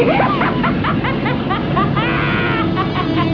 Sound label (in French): rir